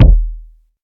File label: low tone bass drum single shot d sharp key